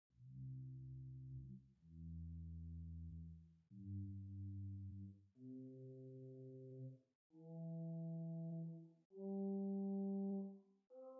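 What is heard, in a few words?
Great Dulciana 8 arpeggio, organ stops; St Annes Moseley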